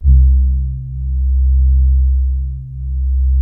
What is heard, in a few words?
soft brass C2; SOFTTRIX; Music Store - Claudius Diemeter Schwerlos